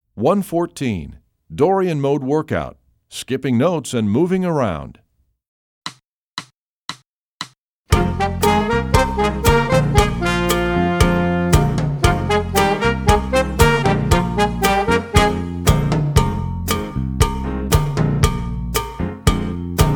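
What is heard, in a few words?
dorian mode workout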